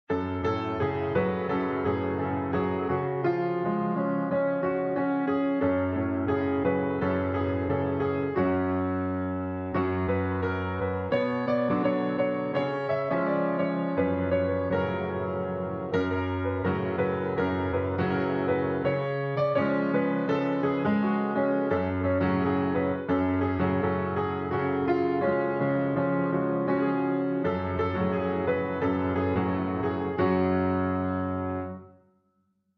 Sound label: Piano; Psalm11